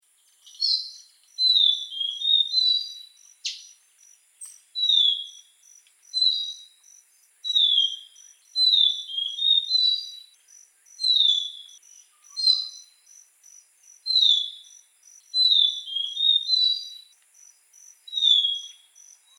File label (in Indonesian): suara sikatan melayu